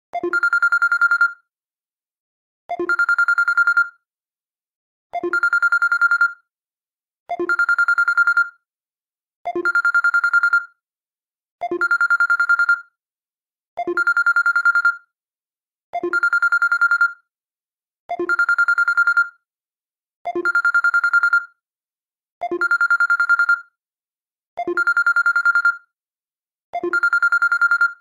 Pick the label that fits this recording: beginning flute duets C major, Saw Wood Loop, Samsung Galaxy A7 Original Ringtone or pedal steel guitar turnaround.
Samsung Galaxy A7 Original Ringtone